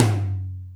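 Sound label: Tom Ambient Md, Alesis D4 drum machine; 300 Drum Machines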